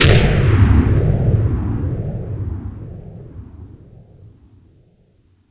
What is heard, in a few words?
gunshot; Zero-G; Soundclash Nu Dance Electro; Acidized Wavs; FX Atmospheres